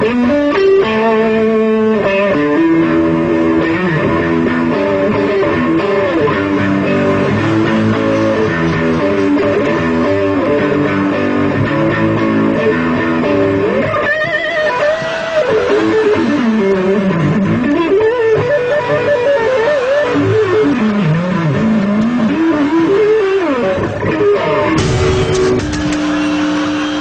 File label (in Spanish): la guitarra, electronic ringtones